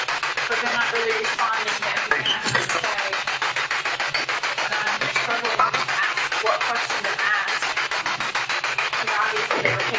Spirit Box; Civil War Wing 3rd Floor Armour Room; madison seminary investigation

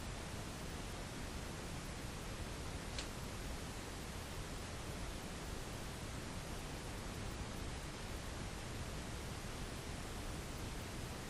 Tattoo Shop Static

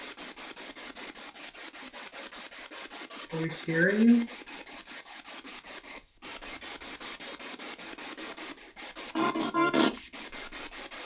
Walking to Vestibule Spirit Box

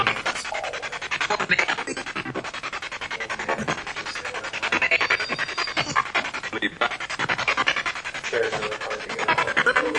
Spirit Box; 2nd Floor Bedroom

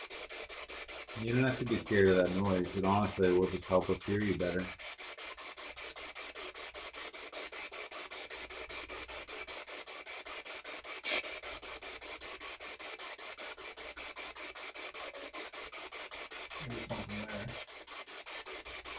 Basement Spirit Box; judson house investigation

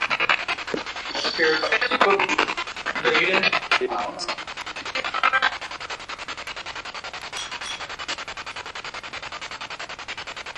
Spirit Box; 2nd Floor Hallway

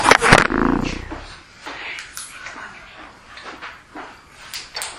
2nd Floor Whisper Room EVP

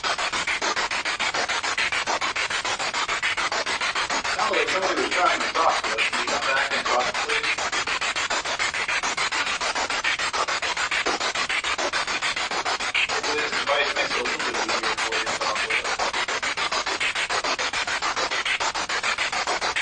Basement Spirit Box

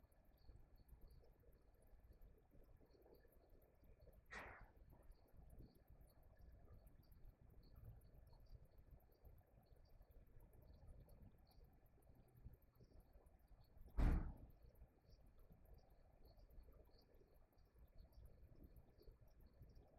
Townsend Room Static; Irish-Townsend House – Investigation

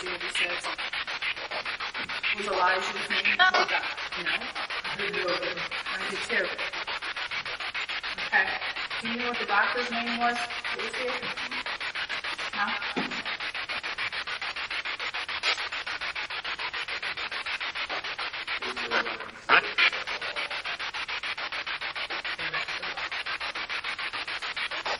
Attic Rec Area Asylum Spirit Box; madison seminary investigation

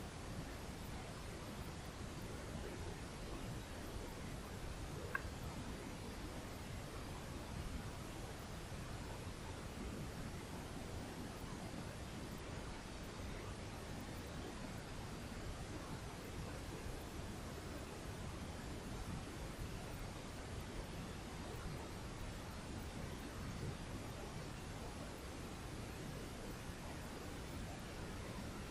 Barn EVP

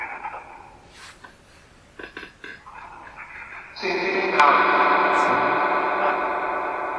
Living Room Phasma Box; private residence freedom road apartment investigation return

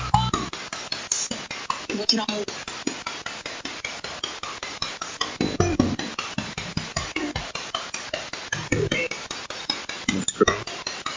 Train Station

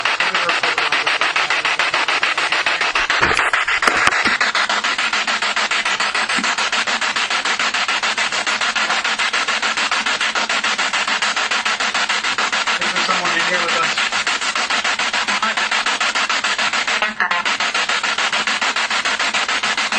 Kitchen Spirit Box

